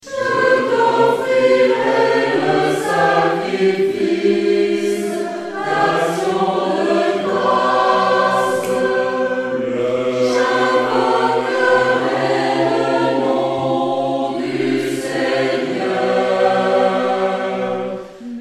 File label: Tutti